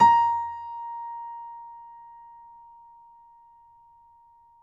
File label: piano; East West; The Ultimate Piano Collection